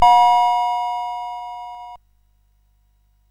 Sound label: Dark Castle, CSG8580R5